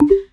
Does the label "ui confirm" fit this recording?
Yes